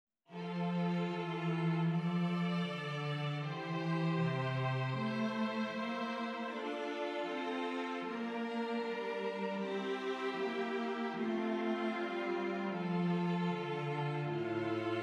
esercizi di contrappunto semplice A tre voci

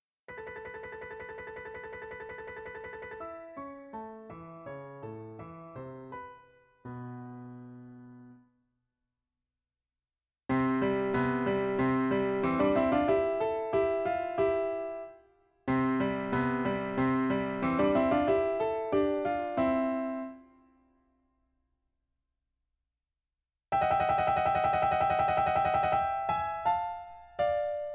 Tre frammenti per pianoforte

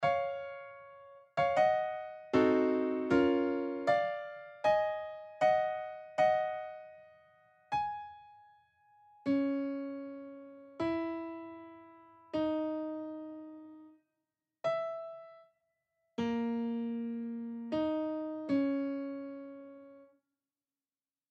Abbozzi per un andante ma non troppo per pianoforte

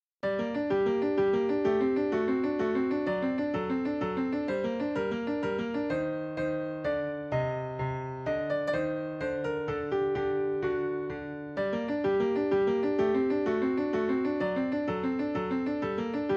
Minuetto per pianoforte

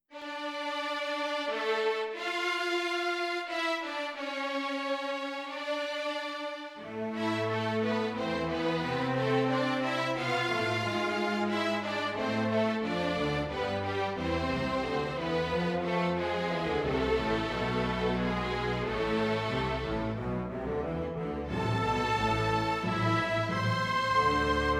Orchestrazione; Ronge; Gerd Prengel

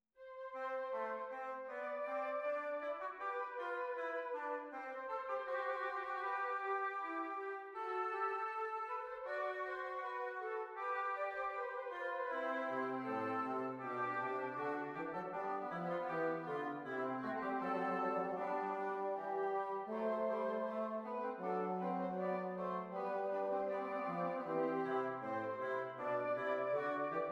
Fuga in fa maggiore; Hess 243; Seconda versione; Nottebohm; doppelfuge

